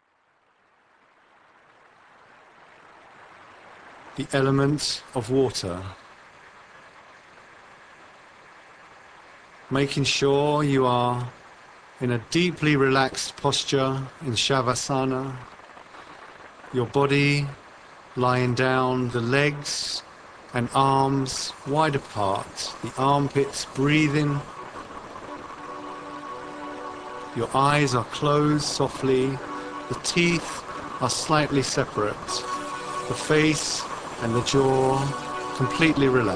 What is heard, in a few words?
Water